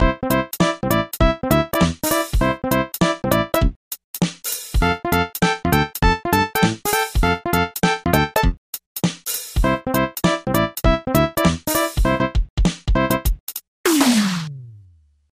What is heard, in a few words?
map music